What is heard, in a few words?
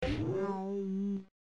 Salsa